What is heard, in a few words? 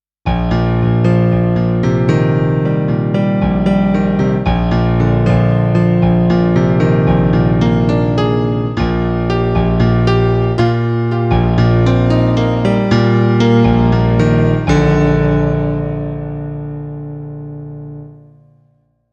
Piano